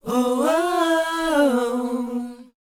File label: WHOA B, Vocal, R&B & Dance; ILIO; Vocal Planet